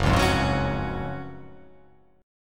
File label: F sharp Major 7th Suspended 2nd, F sharp, down